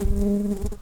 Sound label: Humilis workers, bumblebees